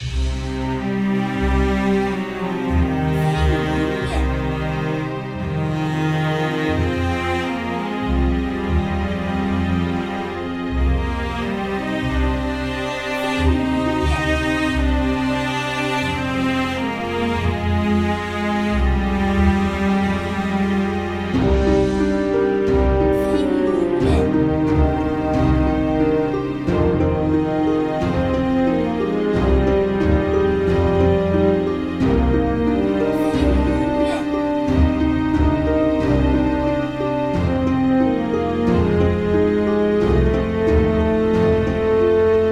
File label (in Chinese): 祭坛